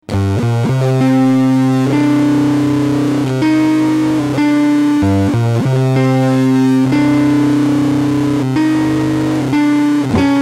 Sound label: squrrl with gtr blend